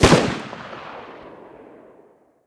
sks muzzle mono far

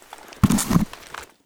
rifle mask use; STALKER; IWP; item usage

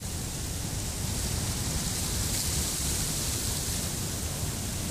wind, nature; STALKER; IWP

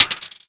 Shell 39mm metal; STALKER; IWP

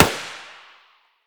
mdr muzzle mono far, weapons

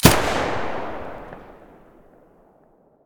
muzzle sa80 stereo

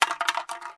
energy drink; STALKER; IWP

